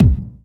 bass drum single hit d key, kick drum single hit tuned to the D note